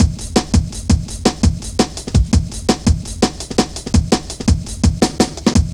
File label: Drum Loops; Zero-G Jungle Warfare 1, 2 & 3